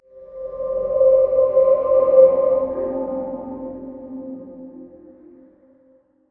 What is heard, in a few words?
SFX Winning; Deus Ex The Fall